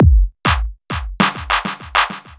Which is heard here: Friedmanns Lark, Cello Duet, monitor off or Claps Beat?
Claps Beat